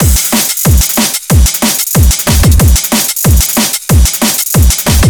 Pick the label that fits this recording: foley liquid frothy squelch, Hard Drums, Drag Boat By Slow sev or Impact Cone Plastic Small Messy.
Hard Drums